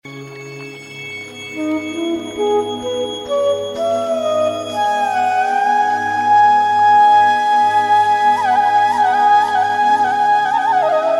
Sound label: Flute